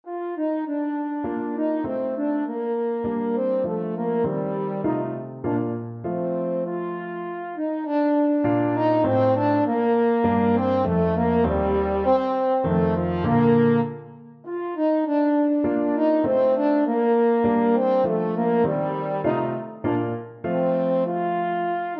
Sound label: three waltzes, french horn